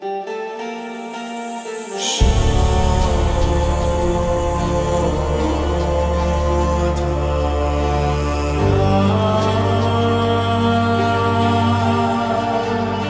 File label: Login Music; Login Screen; Whispers In The Walls